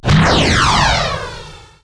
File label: wiz spawn sfx